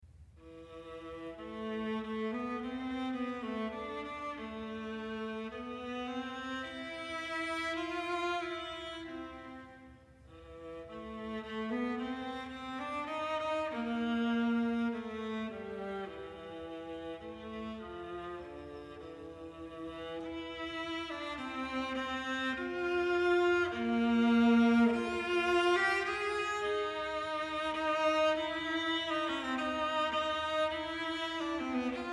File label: quartet; shostakovich